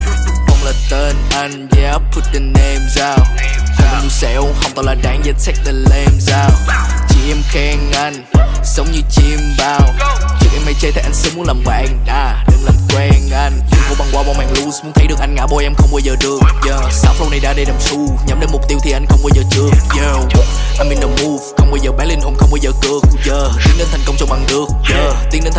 rap

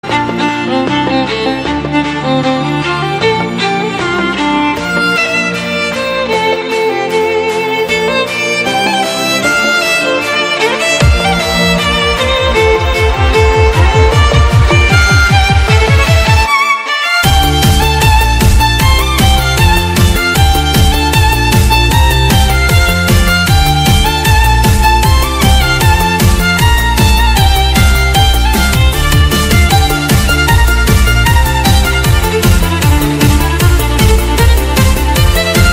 Violin

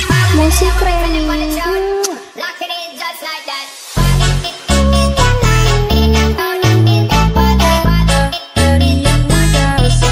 slow beat Music Remix

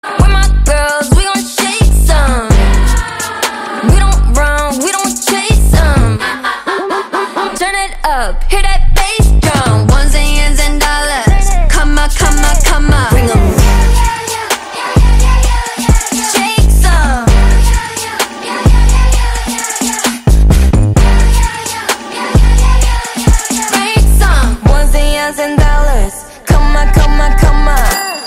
meow; kpop

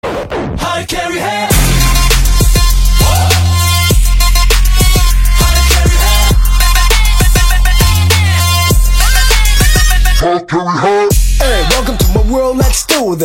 kpop

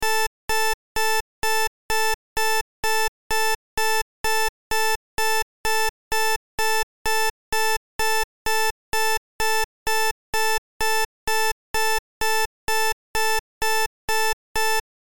bao thuc digital alarm clock sound

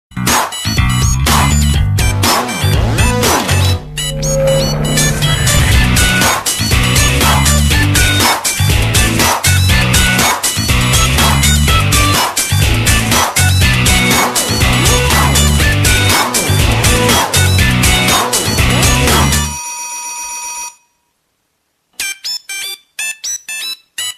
nhac chuong sony remix

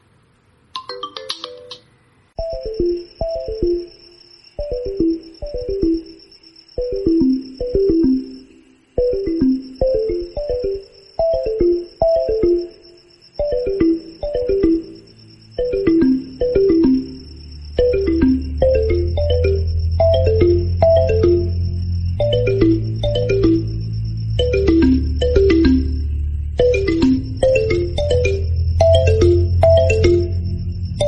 samsung galaxy m01 core

